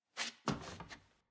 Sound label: Footstep Wood Rotate